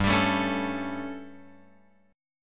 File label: G Suspended 2nd Flat 5th G, chord; Gsus2b5 Chord {3 X 5 2 2 5} strummed